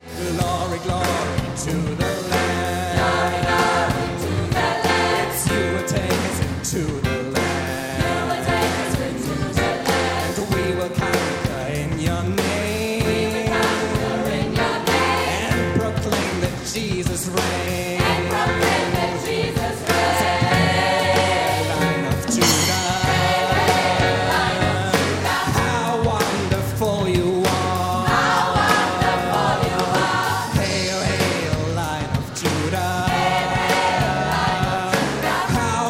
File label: victory chant